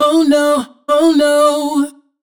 riff oh no Am wet, Vocal; Slate Digital Omnivox Vocal Sample Pack

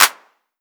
Clap, 909; Slate; Vintage Drum Machines Volume 3 909 Edition

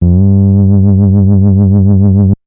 Synth one shot Dip G, Bass; Synth Bass One Shots; Slate Digital BASSHOLE Sample Pack

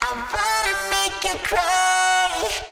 phrase Bm, Vocal; Slate Digital Omnivox Vocal Sample Pack

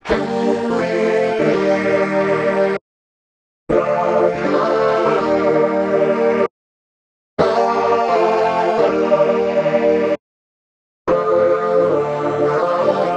songstarter 130 Gm; Slate; SD PASADENA Pop Sample Pack

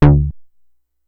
Synth oneshot Short D, Synth Bass One Shots; Slate Digital BASSHOLE Sample Pack

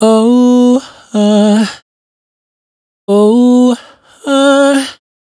riff Oh Huh 78 E, Raw Vocals, Dry Vocals; Slate Digital Omnivox Vocal Sample Pack

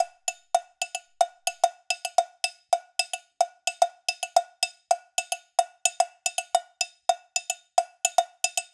cowbell loop, 110; perc construction loops; SEISMIC Drum Sample Pack; Slate

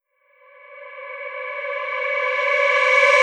reverse, vocal sample; Slate Digital Omnivox Vocal Sample Pack

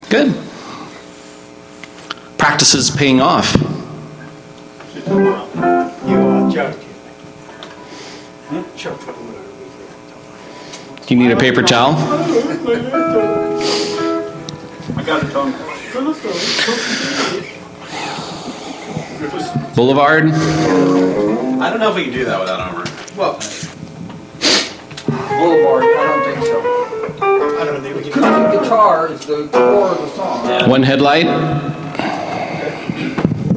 Background Mic Mix

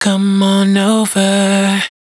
hook 82 Dm, Dry Vocals; Raw Vocals; Come on Over; Slate Digital Omnivox Vocal Sample Pack

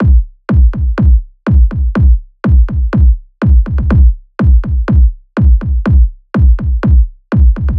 kick 123, Kick Loops; Drum Sample Pack; SD SEISMIC; Slate

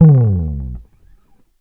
Bass one shot Dive FX Dark High, Electric Bass; Slate Digital BASSHOLE Sample Pack

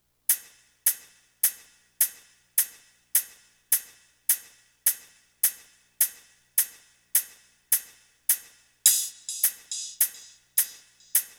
hat 105, Drum Loops, Hat Loops; Cherokee; Slate; MIDNIGHT Sample Pack

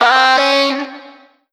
Vocal one shot Db; Slate Digital Omnivox Vocal Sample Pack

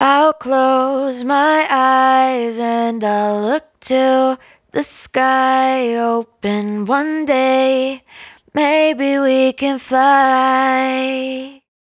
hook We Can Fly 140 Ab, Dry Vocals; Raw Vocals; Slate Digital Omnivox Vocal Sample Pack

